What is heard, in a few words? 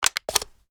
reload mag out, arms; emx umx32